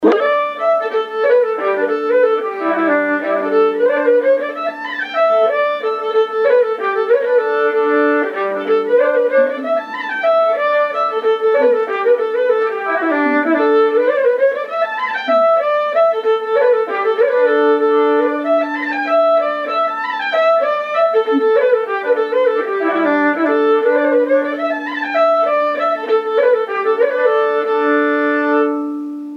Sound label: Fling; Maurice OKeefes